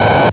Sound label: Spark Coil Dash, Morse; NuMorsePro